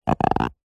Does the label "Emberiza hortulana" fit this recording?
No